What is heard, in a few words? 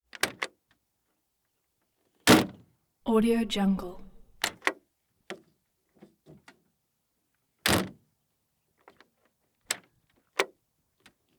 greyhound bus door open close